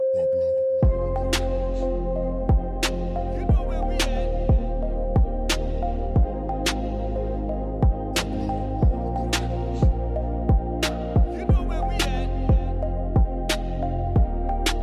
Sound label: Reggae Dancehall